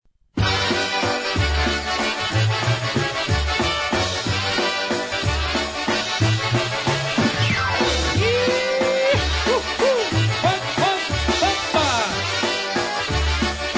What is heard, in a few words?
oberek